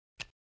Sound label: clicky bite